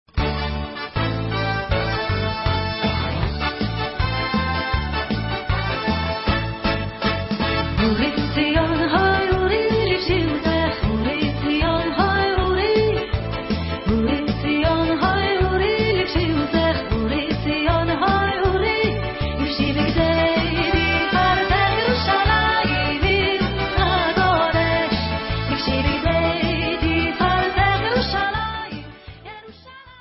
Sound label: Vocal